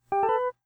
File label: voiceact on; TeamTalk v5.5 ubuntu18 client